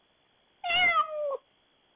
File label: cat meow